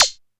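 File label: hat closed, Alesis DM5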